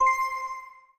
gambler level up; Hot Party Deluxe; Wazdan

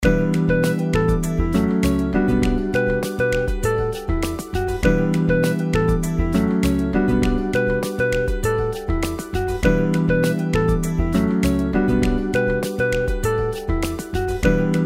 intro bgm; Stocked Bar

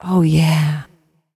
mouseover left girl; Wazdan

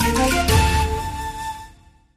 cascade win; The Golden City

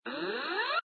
GAMBLE WIN; Magic Flute

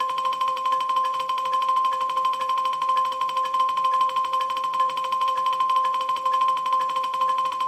increment; Wild Ape

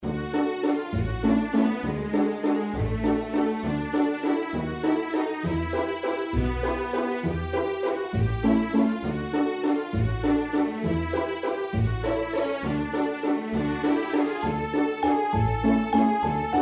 circus bgm; games; Crazy Circus

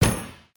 manual stop button; Zhao Cai Tong Zi

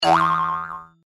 hit; Joker Slot; slot game

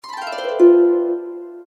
intro outro sfx; Stocked Bar

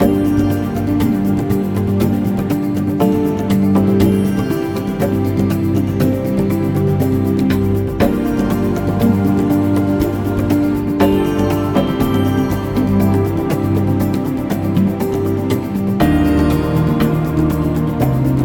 ambient free games; Lost Treasure; Wazdan

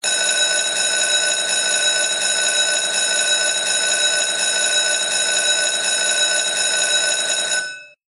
RING LONG; Sizzling 6

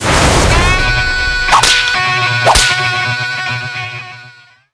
win; Wazdan

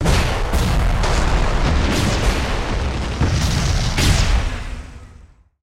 Big boom; Thunder Fighter

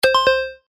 increment multiplier; Origin Of Fire

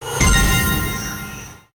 nice win; games; Beauty Warrior; slot

